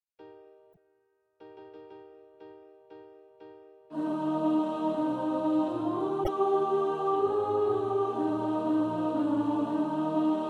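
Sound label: soprano, Practise Music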